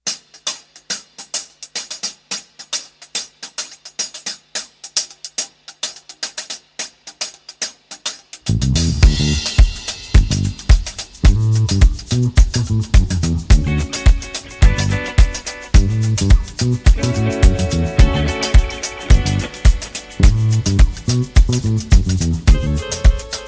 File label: Dub